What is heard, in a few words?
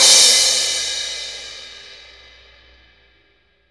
CYMBALS, Disco House